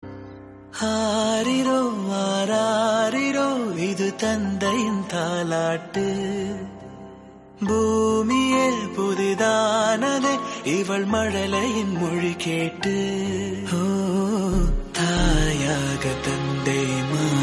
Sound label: Tamil Father song; Deiva Thirumagal